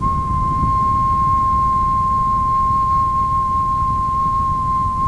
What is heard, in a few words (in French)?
acou, acouphene